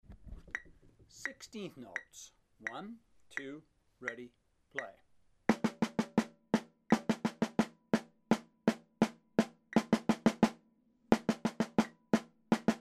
sixteenth notes

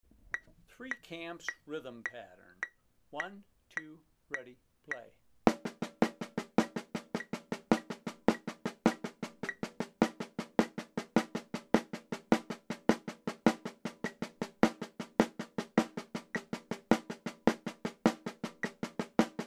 three camps rhythm pattern

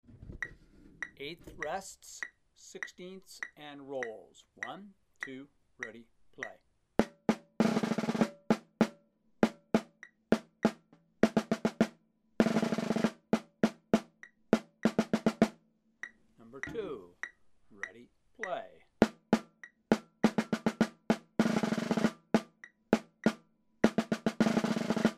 eighth rests sixteenths and rolls

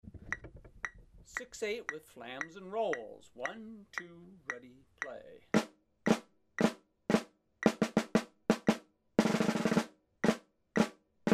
six eight with flams and rolls

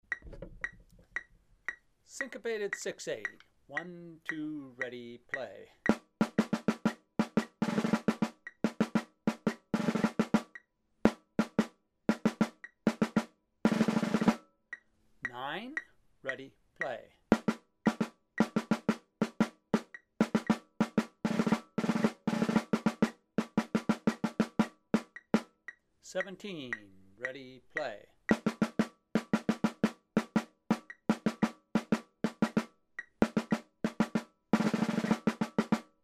syncopated six-eight

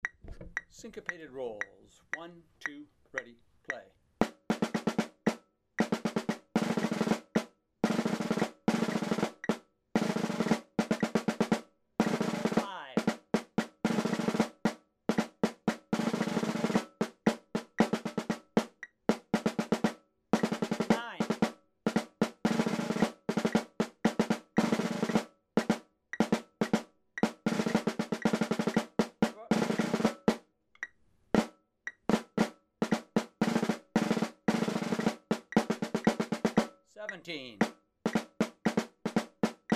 syncopated rolls

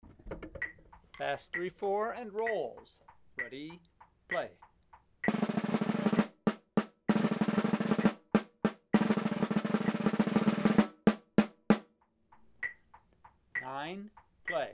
fast three-four and rolls

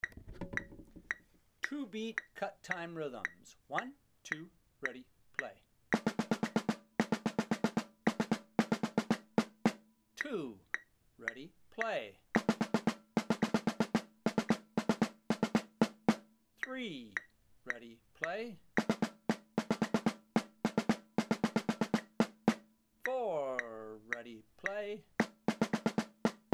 two beat cut time rhythms

